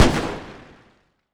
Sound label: m79 fire; explosive; garrysmod